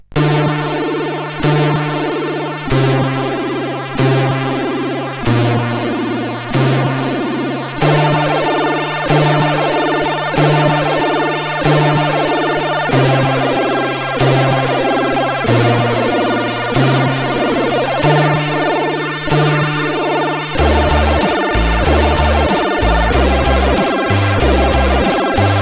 sid CSG 8580R5

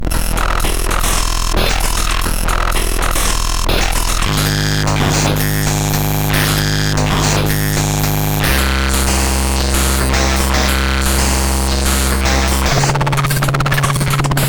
Drum Controller, MOS6581R3

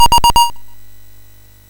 Dragons Den, MOS6581R3

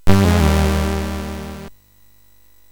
Loopz, MOS6581R3

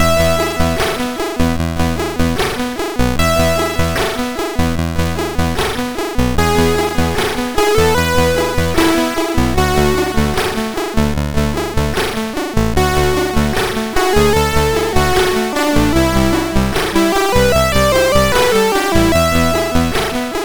Vikings loader sid MOS6581R2

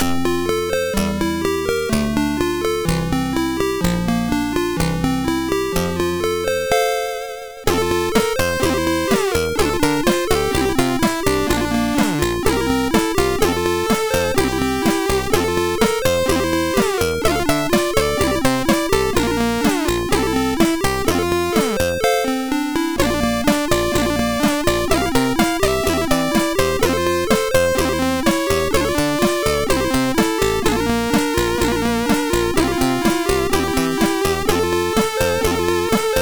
Keyboard, sid MOS6581R2

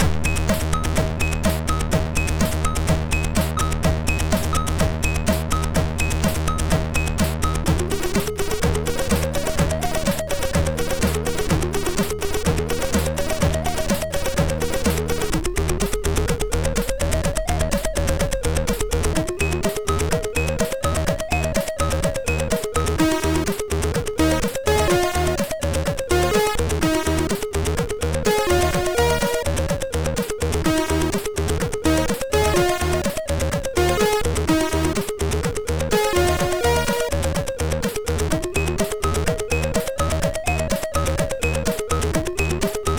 Savage Tune, MOS6581R2

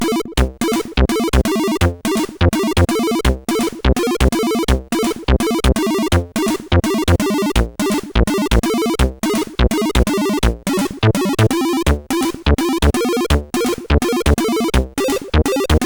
Blues, CSG8580R5; Spike Super Blues Experience

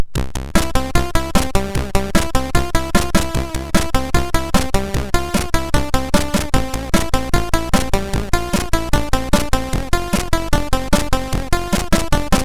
sid, MOS6581R2; Arcade Daze

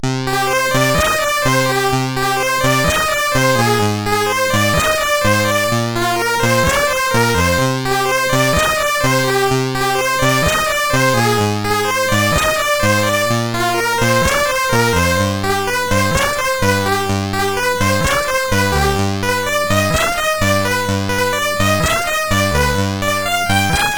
Music Samples, sid, CSG8580R5

